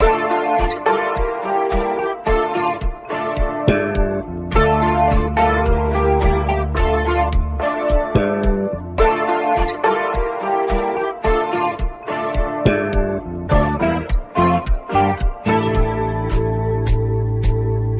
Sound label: guitar disco, Loops, Sad